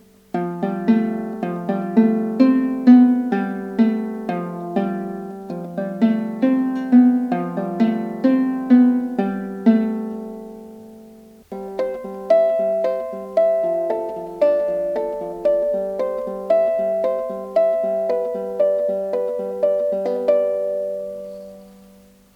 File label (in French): lyre chevalet ambre grave harmonique